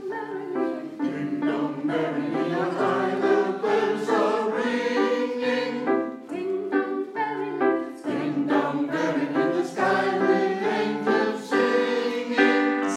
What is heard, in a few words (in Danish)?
ding dong vers bas og alt